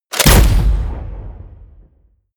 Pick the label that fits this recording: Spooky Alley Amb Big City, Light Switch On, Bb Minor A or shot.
shot